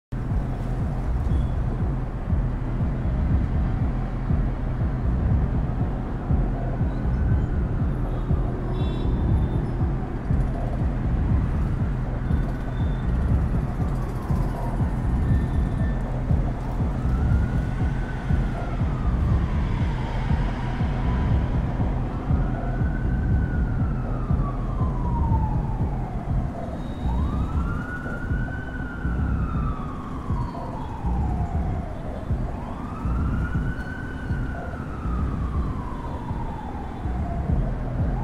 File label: street club